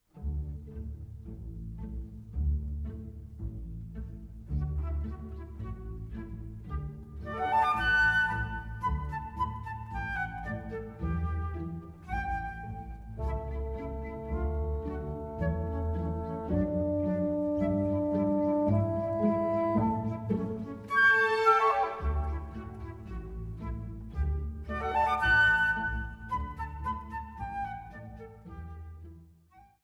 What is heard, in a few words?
piano